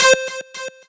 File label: Synth